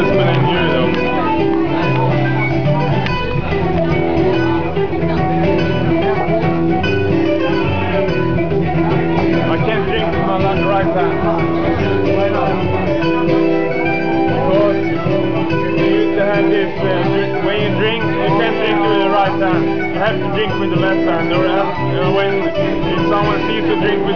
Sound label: irish music at pub; in sollentuna